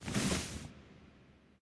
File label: cloth; smite